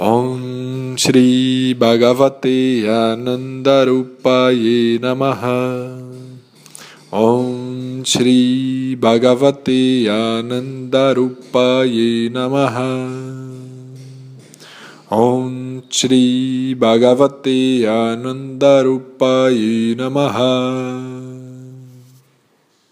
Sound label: Ananda Mantra